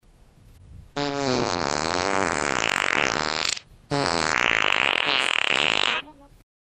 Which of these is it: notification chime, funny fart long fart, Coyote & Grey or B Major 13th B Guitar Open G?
funny fart long fart